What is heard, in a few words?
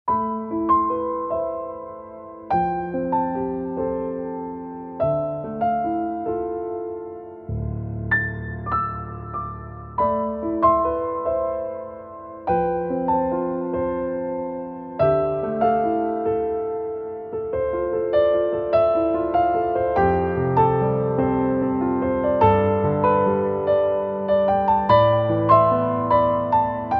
emotional piano sad background music